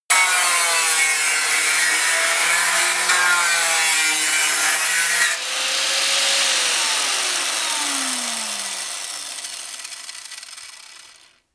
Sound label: grinder deep on load heavy stop